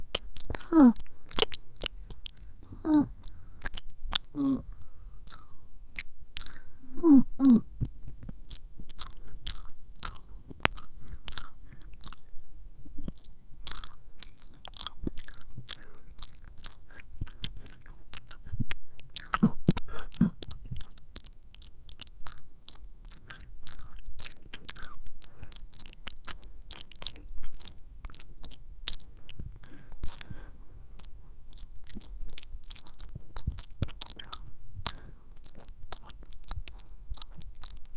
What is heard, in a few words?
close up asmr mouth sound